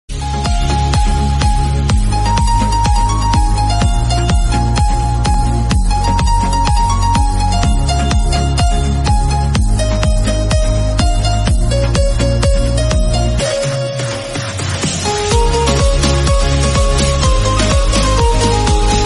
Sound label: instrumental ringtone